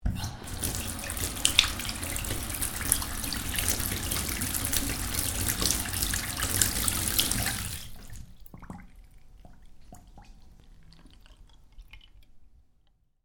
Tap bath, Tap: Water Runs Into Bath Then Drains Away; Bathroom; Household; Stuart Duffield